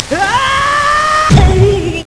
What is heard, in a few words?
fall; quake2; link